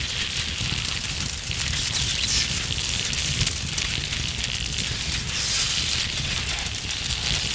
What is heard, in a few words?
amb fire; Star Wars Knights Of The Old Republic II; XBOX